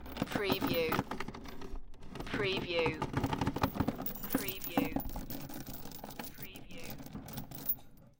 Heavy Chain Drag Along Wooden Floor

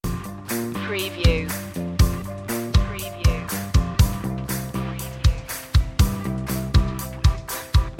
tough life loop

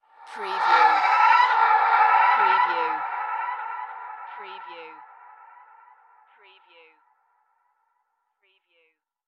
Ghost Shriek Talk

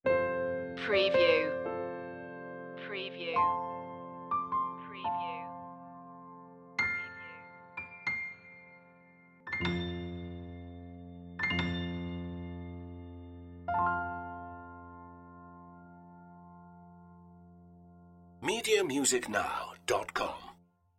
silent night piano; media music now

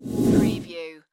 Space Door Open Whoosh

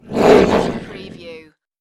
dog monster growl

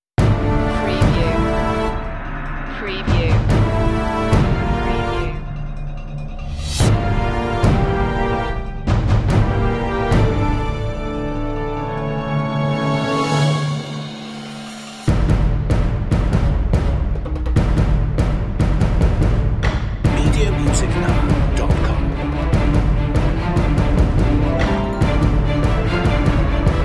no choir version

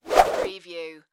Whip Movement Slow; Sound Effects Bible

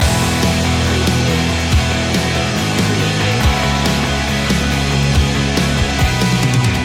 the race loop

